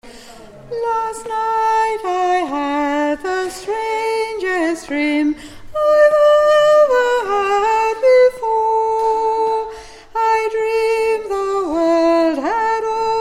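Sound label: soprano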